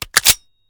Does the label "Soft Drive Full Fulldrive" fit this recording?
No